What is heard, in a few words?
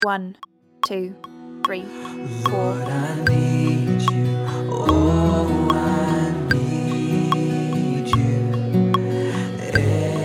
acoustic